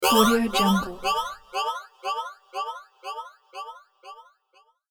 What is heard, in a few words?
arcade game strange pulse delay